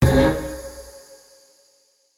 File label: Attention Free Spin Snd; mythic maiden